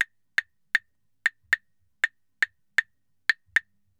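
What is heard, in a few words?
CLAVES SALSA, salsa drums and percussion; Best Service ProSamples vol.14; AKAI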